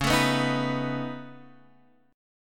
C sharp 7th Suspended 2nd Sharp 5th C sharp down Guitar Half Step Down; C#7sus2#5